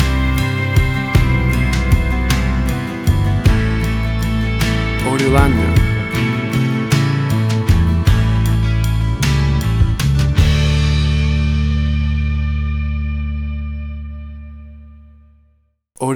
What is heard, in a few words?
Country Wedding Waltz